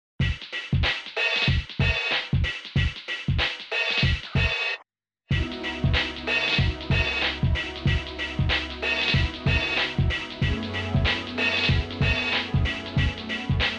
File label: acid jazz